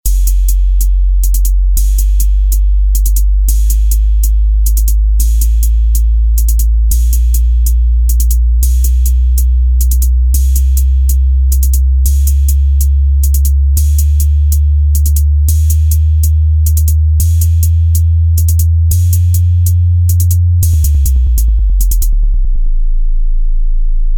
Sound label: quick bass test